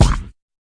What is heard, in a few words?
fx drum